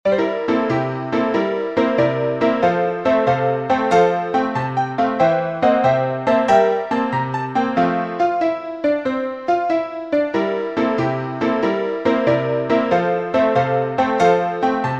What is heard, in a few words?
PIANO